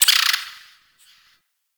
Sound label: Forest Distant Medium Creature Tree Small Call; Warframe; Grineer Forest